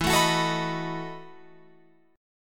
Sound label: E Major 7th Suspended 2nd Suspended 4th, Guitar, Open F; EM7sus2sus4 strummed